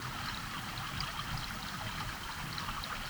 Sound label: Waterway B Dark